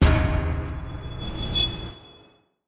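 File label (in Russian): внезапный ужас поражает, переход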